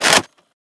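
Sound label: charging handle back; cstrike; valorant; araxys operator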